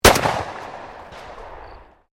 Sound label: SHOTGUN 12 GAUGE PUMP ACTION FIRING, Sporting Shotguns; Shotgun Sound Effects